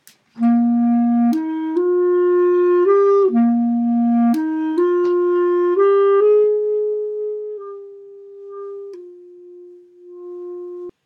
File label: Waltz